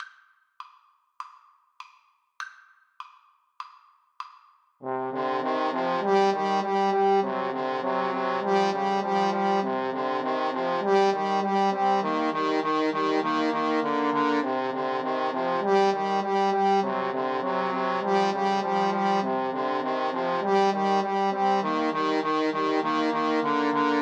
mountain king, Trombone Trio

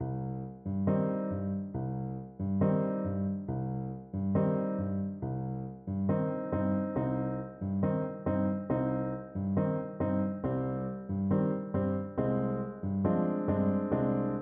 bizet habanera kar, Flute

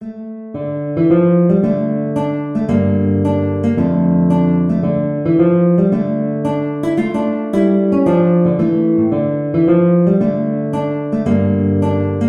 guitar

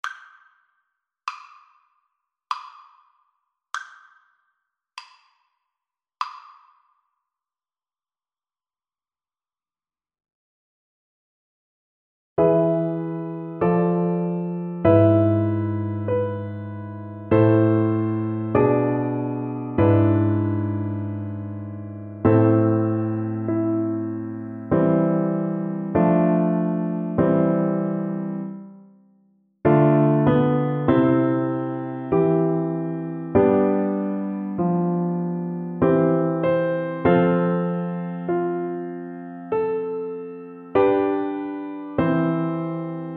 sonata, Cello; Slow Playalong; Marcello, Benedetto - Sonata No. 5 - Mvt 3 - Largo

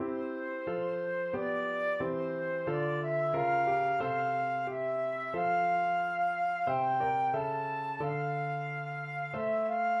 flute

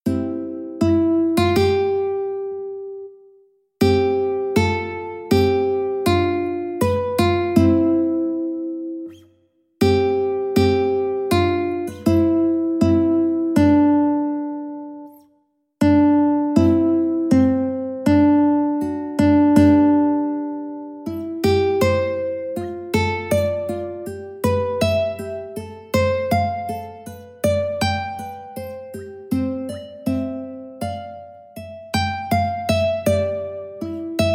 come again ukulele

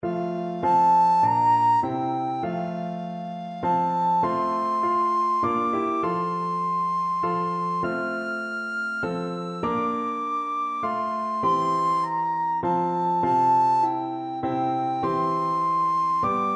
rockingham recorder

